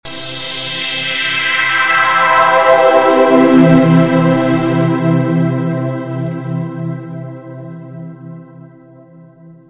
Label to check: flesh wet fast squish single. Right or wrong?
Wrong